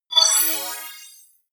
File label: appear sparkle positive short